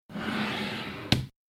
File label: Drawer Open Or Close, Sound of a wooden drawer sliding; a knock at the end